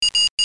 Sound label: Sound Beep